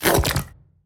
poop raise

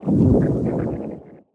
growl medium; fistula

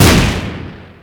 boss explosions

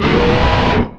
monster roar

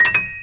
key pickup; guantlet

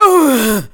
hurt

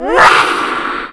roar; isaac

